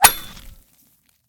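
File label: pickaxe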